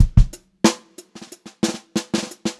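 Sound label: groovy variation, Funk-Groove Drumloops; USB Soundscan vol.38; AKAI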